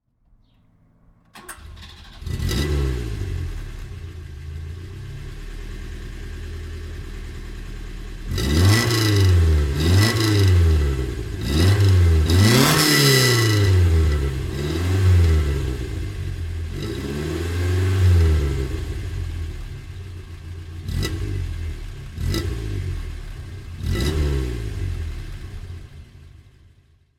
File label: Lancia Fulvia Sport; Fulvia Coupe